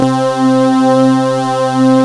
HOT FUZZ, TECHNO; Pro-Rec - Synthsonic; Z1 TECHNO

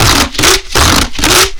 BASS SHOT

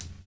KICKING; Univers Sons - Kitch 70s

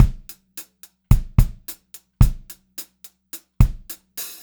POP VARI, Pop-Rock Drumloops; 108 POP

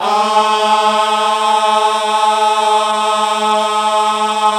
GOSP. AAAHH; Sound & Vision - Gigapack II CD 1

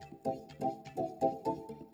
E PIANO 125, Keys; Houseworx

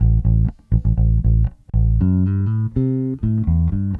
Bass, 70s Analog Funk

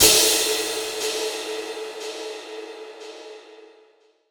Crash, impact samples; Crashes

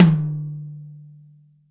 TOM, Real Drum Kit, Rock Kit

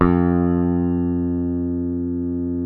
F2, Bass; Sampleheads; Will Lee Bass Library

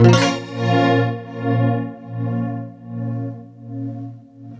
FX guitar; USB Soundscan vol.31; Miro s Grooves; AKAI

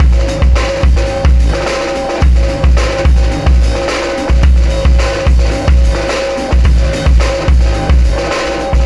System Overload Beat, Processed Drumkits

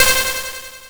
blips, opl3

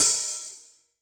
HiHat; Yamaha DTXpress-II

